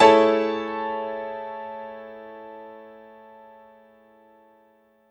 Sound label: Piano stack (A) maj; Sonic Foundry (Sony Creative Software); Ugly Remnants VOL-2